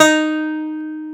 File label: Domra D#3; Multisamples; Digital Sound Factory Planet Earth